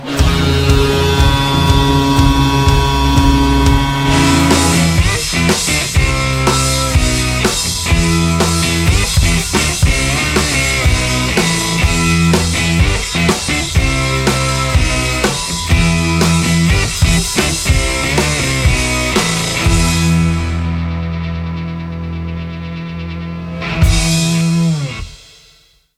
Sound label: goal horn